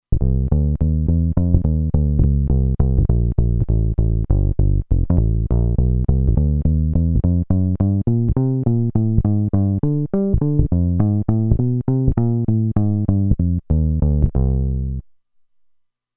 E Jazz Bs, Roland Alpha Juno-2; Factory 2

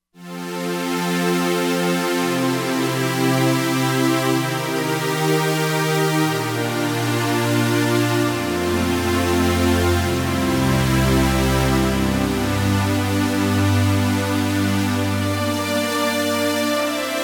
Machine Str, Roland Fantom XR

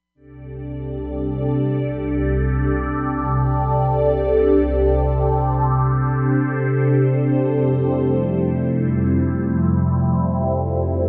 JP-8 Phase, Roland Fantom XR

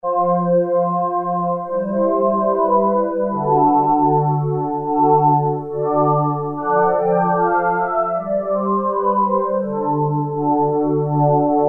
Voices, Roland MKS-50; Factory examples

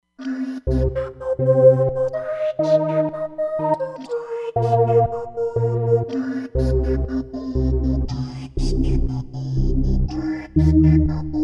Breath Slice

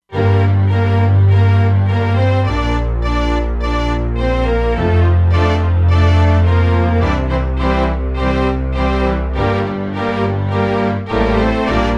Basses & Vios; Factory Presets; E-mu Proteus-2

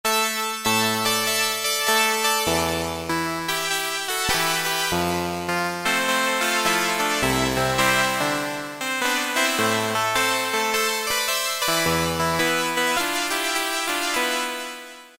Harpsichord; Cheetah MS6

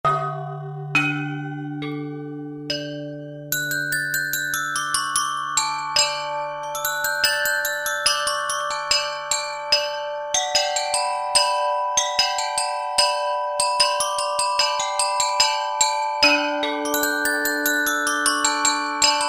Sweet Bell, Alesis Z3; Sanctuary

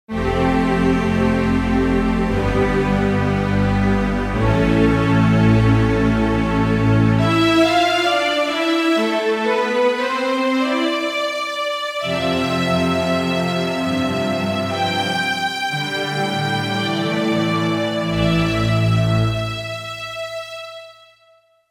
St Strings, Roland JV-880; Factory Patches

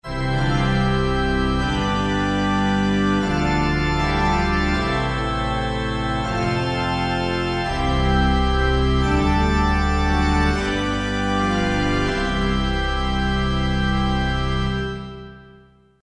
Pipe, Yamaha TG33; Factory Presets

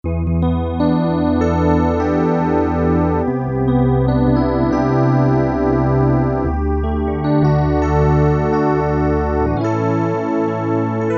E. PIANO, Korg DW-8000; Factory Patches

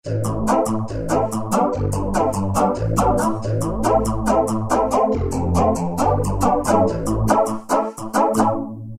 SC Vocal, Yamaha TG500

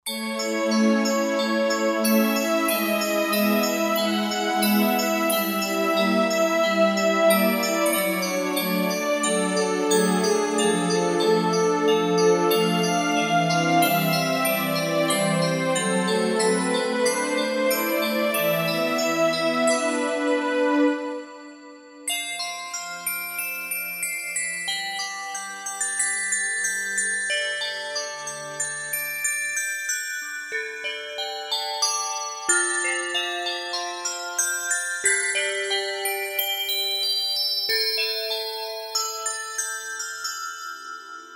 Belstring, Roland JD-990; Factory Patches

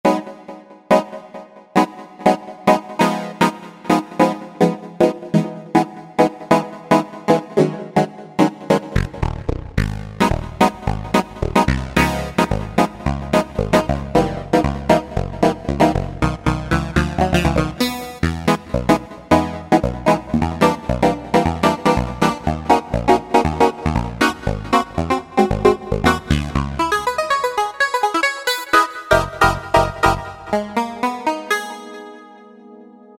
Chop Chop, Generalmusic S2R

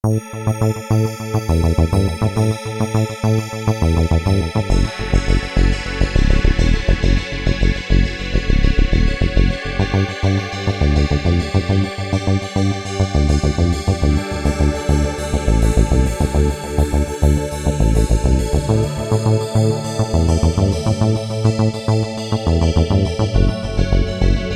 RadiaSweep, Korg Radias-R

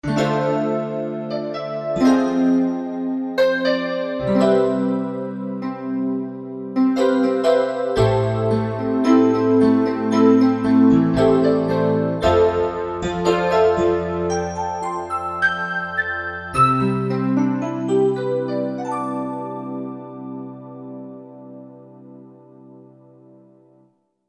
Piano; That Piano

